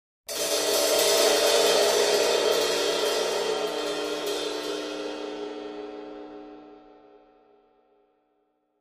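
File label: Cymbal Large Trill, drums cymbal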